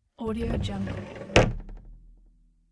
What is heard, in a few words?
piano sliding key cover open